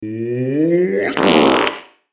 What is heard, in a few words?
fart